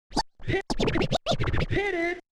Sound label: OLDSCHOOL SCRATCH 96